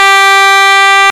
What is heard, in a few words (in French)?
corne brume